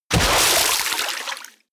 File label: body fall water harsh splash; krieg-waffen; nahkampf; soundpool